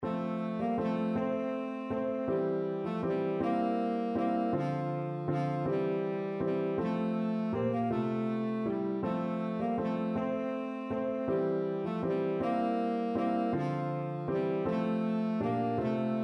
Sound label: tenor saxophone